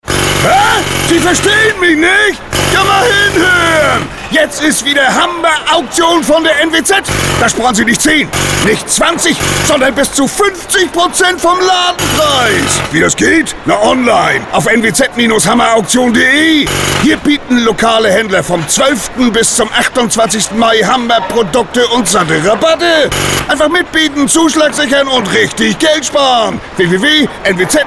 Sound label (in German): PRESSLUFTHAMMER; HAMMERAUKTION